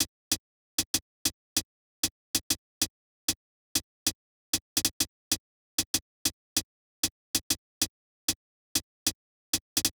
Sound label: Hat, Drum Tracks, Amin; Hip Hop; big fish audio; Hip Hop Exotica; Apple Loops